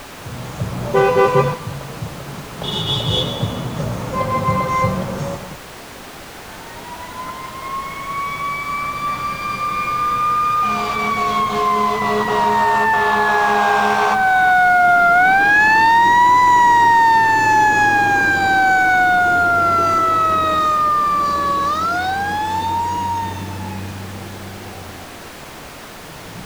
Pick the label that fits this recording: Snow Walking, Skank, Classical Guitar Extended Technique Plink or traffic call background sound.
traffic call background sound